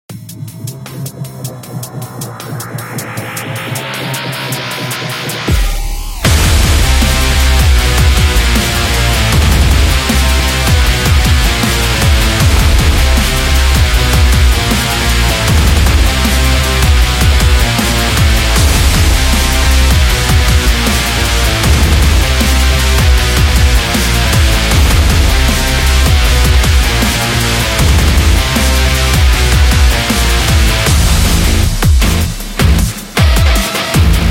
Extreme Sport Rock, High-energy background music

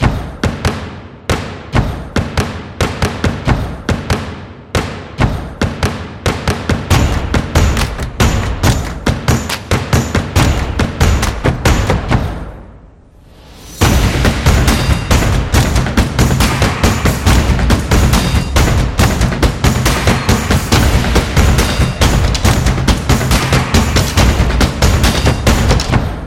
Stomp Beat

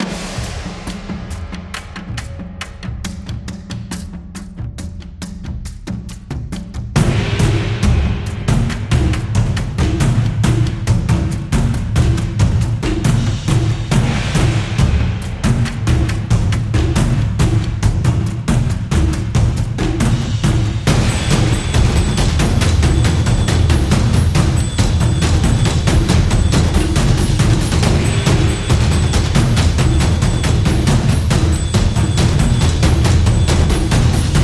Japanese Percussion

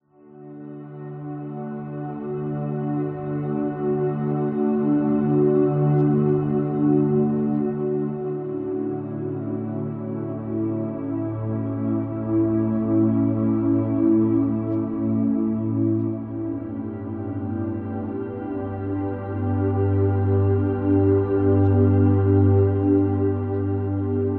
This Is Meditation, meditative

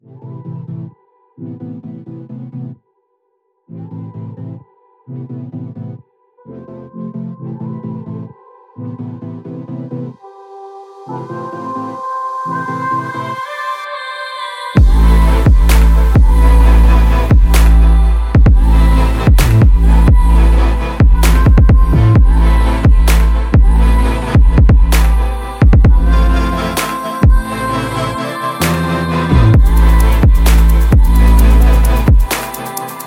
Future Bass Trap

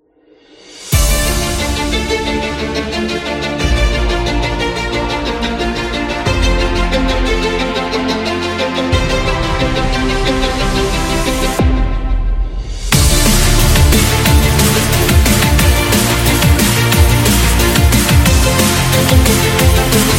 Sport Background; documentary

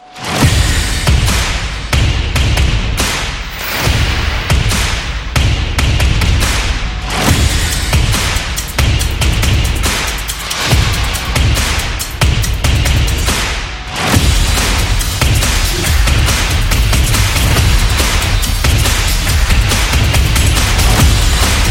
Opener Epic

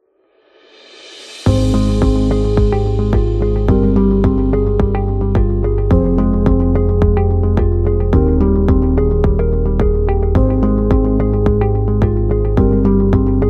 Soft Emotional Corporate; friendly

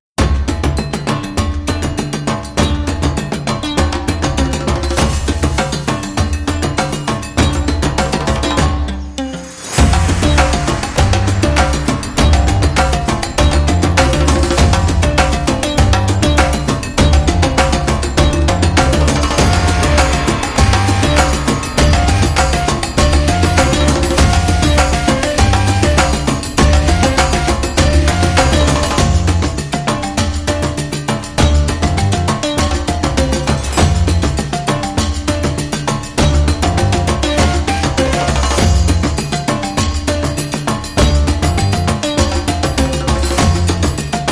Zoo Music, African Tribe Music

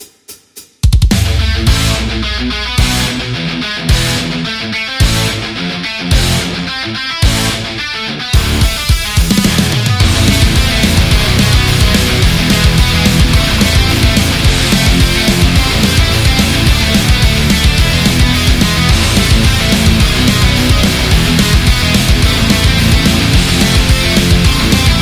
Punk

